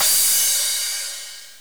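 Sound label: cymbal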